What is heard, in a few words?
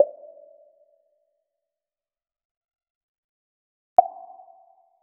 create a combination of, pop